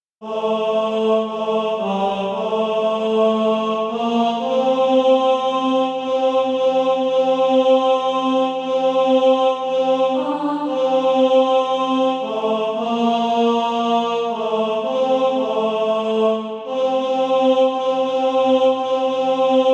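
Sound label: tenor; Eternity Is Drawing Nigh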